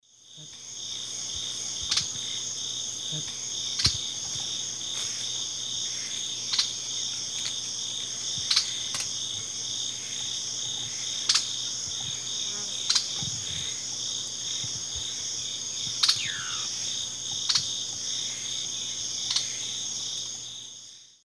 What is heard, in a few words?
Dendrocincla merula, calls; S. America; Eastern Lowlands